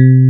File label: E.PIAN2 C3; Big Fish Audio; Synth City